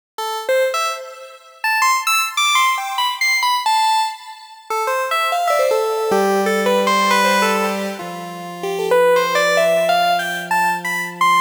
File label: basic lead sounds; digital; the digital life